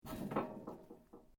metal rods move; Sound Effects:Materials; Foundation3

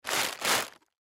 Gift Bag Movement, rustling; Foley; Objects; Blastwave

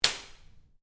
Whip Crack Belt Strap; Foley sounds for melee weapons; Objects

